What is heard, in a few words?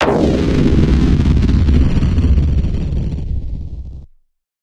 Explosion